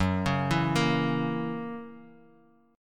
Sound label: Gb 7th Gb down Guitar Open D